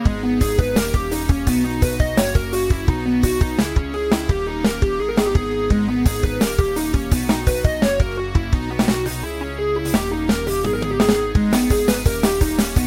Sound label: lead gravitational energy